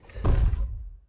elevator stop; puzzle

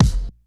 Kick Layered; One Shots; Drums; Boss

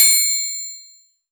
Triangle; One Shots; Drums; Ableton Live 12 Lite; Core Library

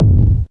Kick; Ableton Live 12 Lite; Core Library; Drums

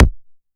Kick Ring Click; One Shots; Drums; Fenk

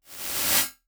Reverse Nonlinear; Convolution; IR Samples; Unusual

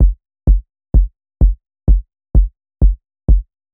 Kick 4-4 Soft 128 bpm; Loops; Drums; Percussion; Ableton Live 12 Lite; Core Library

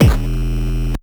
Bass E1, Synth; One Shots; Instrument

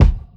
Kick; Ableton Live 12 Lite; Core Library; Drums

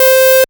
Acid Meltdown C6, Synths; Multisamples; Ableton Live 12 Lite; Core Library

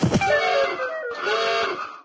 knockout; There; ThereClient; gamekit; gunkit

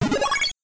bubbly; Super Smash Land

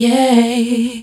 Vocal Long Yay; Ableton Live 12 Lite; Core Library

